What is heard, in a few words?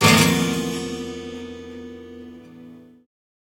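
guitar acoustic hit; 3maze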